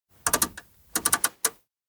knob turn; anemoi パッケージ版

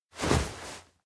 hug; awayuki; anemoi パッケージ版 1.1

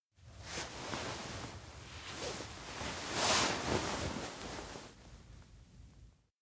futon wake; anemoi

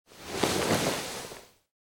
cloth move catch girl; anemoi

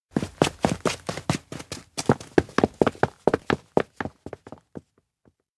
steps indoor town hall chase; makoto; fumiya; anemoi パッケージ版 1.1

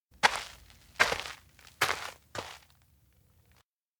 steps outdoor schoolyard walk; anemoi

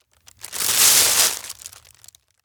vinyl bag receive; anemoi

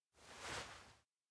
wave goodbye; awayuki; anemoi

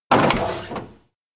door house open slide; jet; anemoi

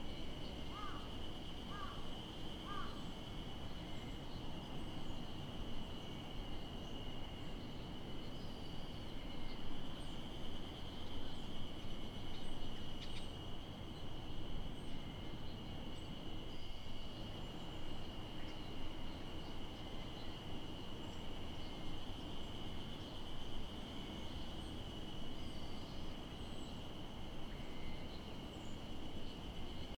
outdoor rebuild town evening

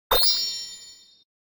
elec kiraan; anemoi

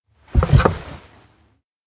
school sign lift; anemoi

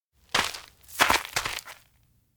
steps outdoor forest start walk; anemoi

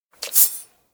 spear hold; anemoi

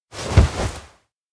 kigurumi head off; anemoi パッケージ版 1.1

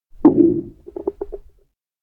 stomach growl; anemoi

